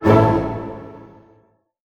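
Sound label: A major pianissimo, orchestra hits; Sound On Sound - Peter Siedleczek's Orchestra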